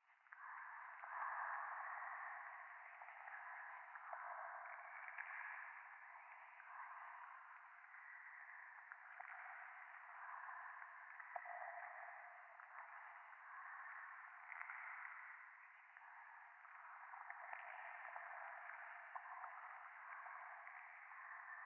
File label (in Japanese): ゴースト しこしこ 触手